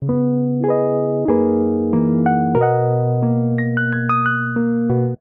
Progression Melody & Chords ii - V - I B Major 92 bpm, Electric Keyboards; Ableton; Factory Packs